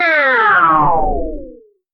short fps round start sou; shooter

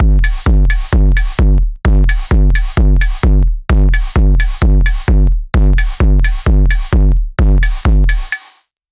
analogical force elektro, 130bpm

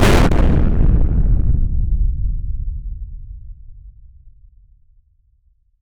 a strong explosion; crashes

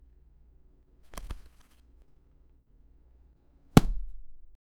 generate a high quality a, books

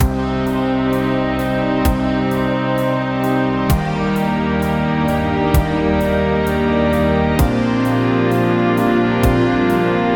soft romantic music begin; gentle

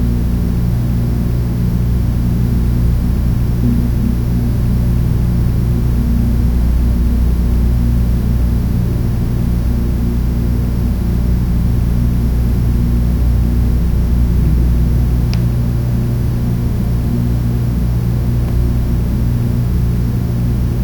loopable ambient sound of, nursery

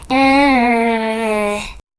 a very cute and adorable

